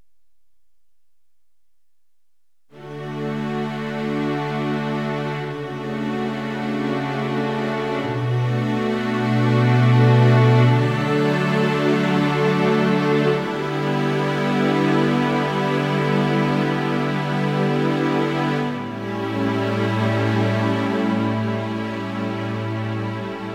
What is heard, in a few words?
Dramatic Cue